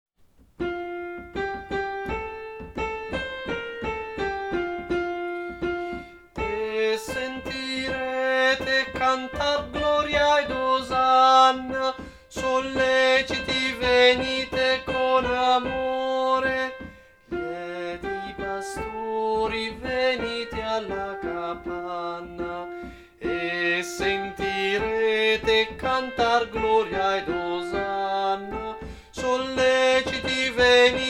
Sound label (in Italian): tenori; Coro Isola del Giglio; Natale; Lieti pastori